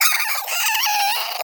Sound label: Vocal Death; Characters; Warframe; Hunting Critters; Cryptilex